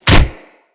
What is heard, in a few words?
Brawl Punch; Characters; Brawling